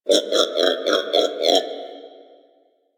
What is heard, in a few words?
Creature, Ambience; One Offs; Creatures; Warframe; Quests; The New War; Drifter Camp